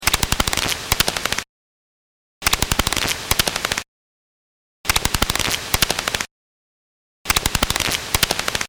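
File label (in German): Wasserfledermaus Feeding Buzz Heterodyn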